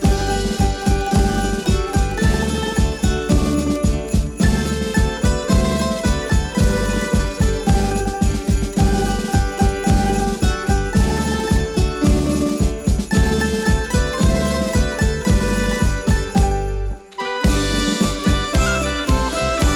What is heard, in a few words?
CHRISTMAS MEDLEY INSTRUMENTAL